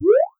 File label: jump